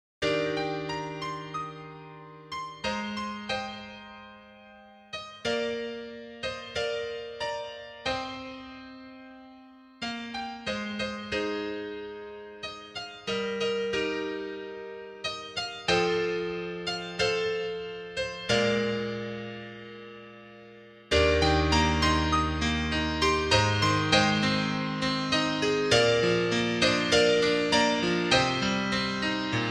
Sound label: piano